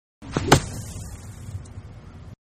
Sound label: Golf-Drive-Hitting Ball From Sand Trap; Sports; Manhattan Production Music